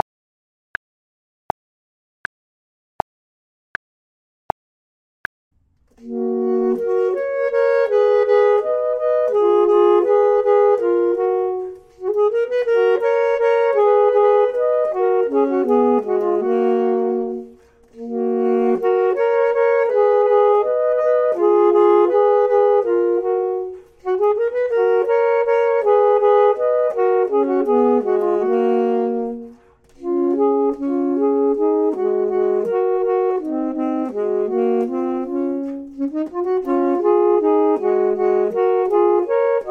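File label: both, Saxophone and Clarinet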